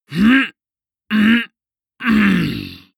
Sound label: male harrumph angry